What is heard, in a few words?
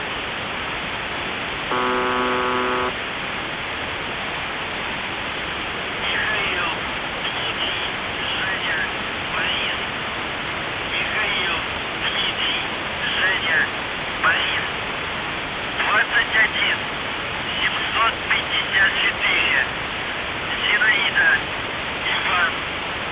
buzzer